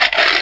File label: scrape, car; q3rally